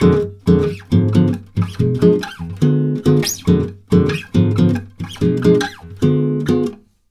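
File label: 140 guitar F DIM, Brazil Bossa; USB Soundscan; AKAI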